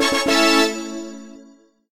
round ended

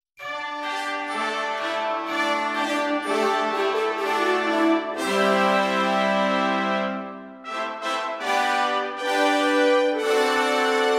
music

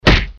hit

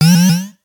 spring

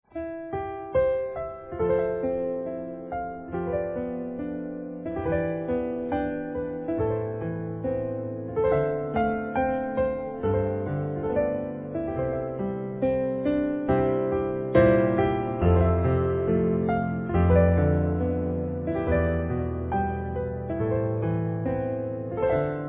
bgm

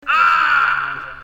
sound dead

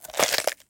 crunch

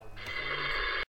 sound roll

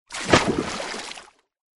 splash

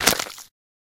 choco crash; tangguo

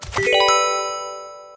show bonus

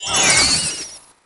pickup magic; game

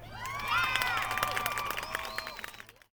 level complete

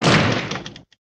boom

